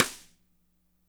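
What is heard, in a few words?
medium snare; Spectrasonics; Burning Grooves CD2; DRY KIT 4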